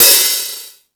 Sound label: High Hat, Drum Machines